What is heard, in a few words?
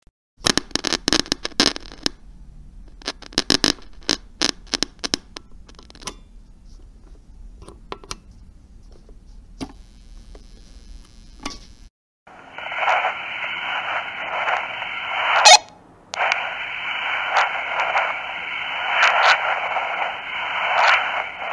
Radio ambience button